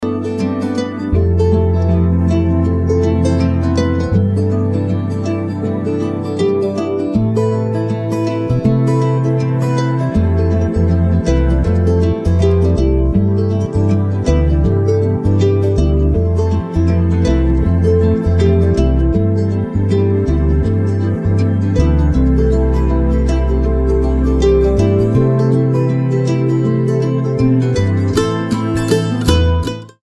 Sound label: Karaoke Song